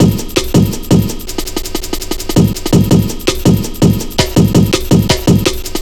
165, Drum Loops, Drum & Bass

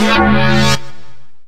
ALIEN COFFEE M, AMB

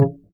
Upright Acoustic Bass

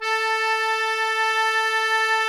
KEY, Cajun Acord

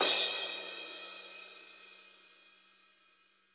CYM; Bob Clearmountain Drums I

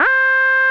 SYN, Drum & Bass, 170 BPM; Ueberschall; Drum & Bass Flash Trackz

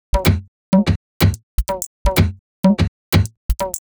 Top C# 125bpm, House Drumloops; Wagons; Zero-G House Fabrik; 90 s Sample CDs

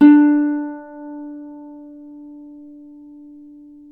Celtic Harp, Plucked Strings

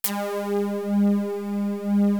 JD synth

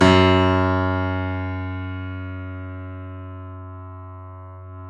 KEY F#1, YC7 Piano mf; Roland L-CD701

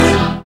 synth chord, dance synth; Sound & Vision - Gigapack I CD 2 (Roland)

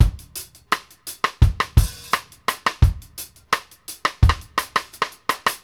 BUSY, KD REGGAE 84; Sampleheads; New York City Drumworks VOL-1

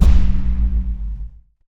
Percussive Hit, contact mic

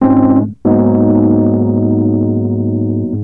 Rhodes, Keyboards; Total Drum&Bass

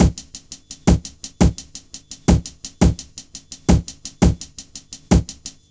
LOOP, Jungle; USB Soundscan vol.05 - Explosive Jungle; AKAI

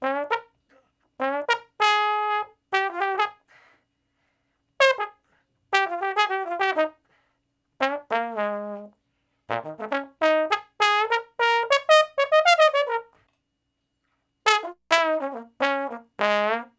Trombone Straight (Bb); Sonic Foundry (Sony Creative Software); Horncraft for R&B; Solos

